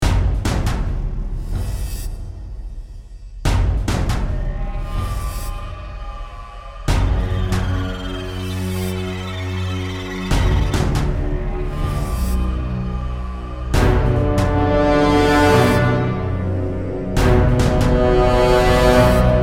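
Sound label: Music Ambient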